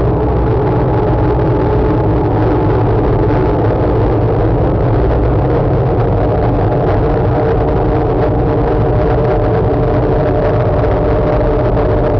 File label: kreepy; quake2